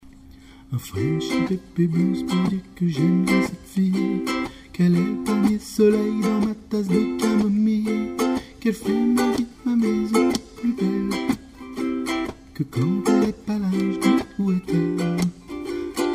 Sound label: ukulele, blues